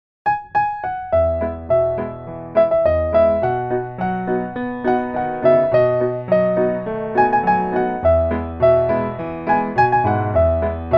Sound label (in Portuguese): piano